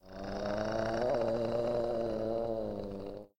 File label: zombie move, monsters